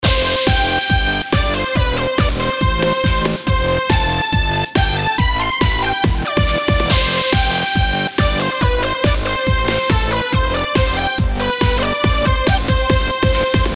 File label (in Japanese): シンセ音作りサンプルPADなし